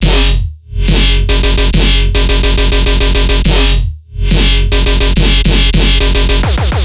Riddim Bass Loop F# 140; Alltron; Ghosthack; Riddim Essentials Volume 2

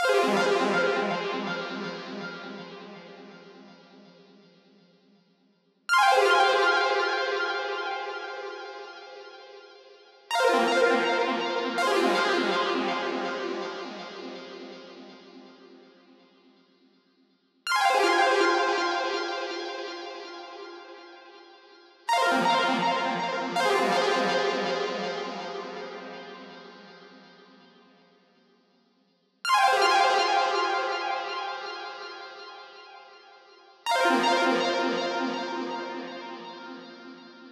First Class - 163 BPM F Min Arp

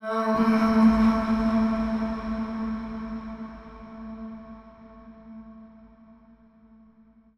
130bpm Am, Vocalpack

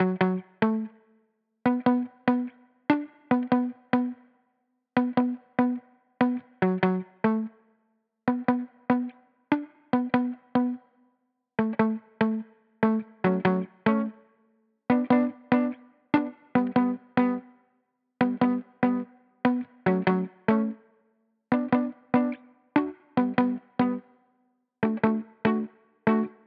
145 BPM D Maj Muted Guitar; Loop Stems; Cymatics; Miami Guitar Pack - Pt 2; Shooting Star

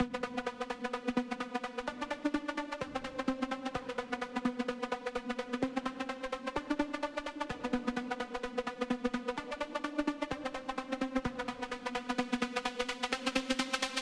128 BPM E Min Chords; House; Loop Stems; Cymatics; Breakdown Loops; Goliath

